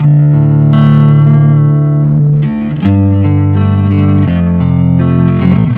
bass, Loops

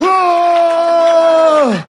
growl

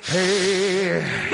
"hey" sung; Voice; ooh ahh

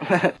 heh heh, laugh; Voice; ooh ahh

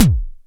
beady, zaps and beeps

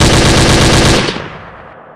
machine gun short; Crash Bang Boom

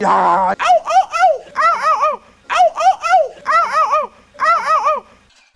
yaa ooh ooh ooh ow ow ow, gibbrish; Voice

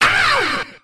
ow

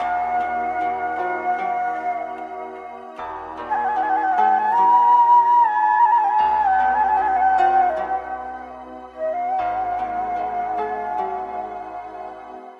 flute, Loops, Sad

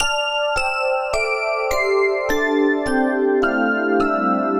keys descending, Sad; Loops

